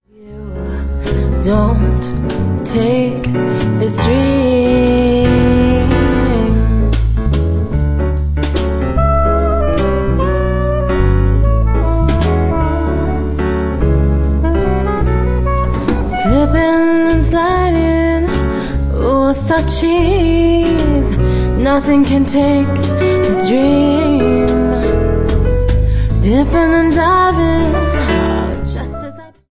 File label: Jazz Waltz